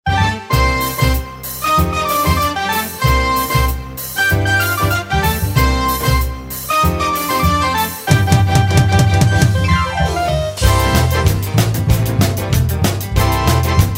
Opening Song Instrumental

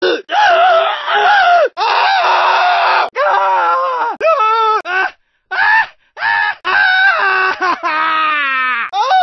pedestrian voices coughing pain male; gta san andreas

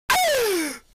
autotuned gasp

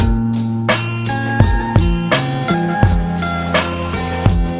instrumental music

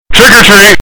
trick or treat scream

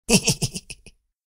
man giggling sound effect laughing sound effects

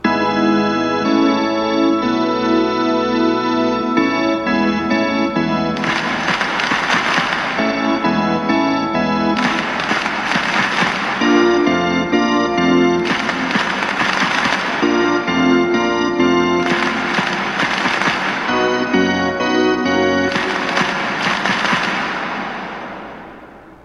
Organ Clap

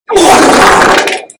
normal splat

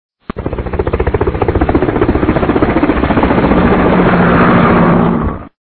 helicopter flying

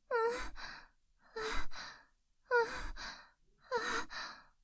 cute anime moan

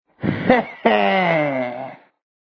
heheeeeeeeeeeeeeeeee

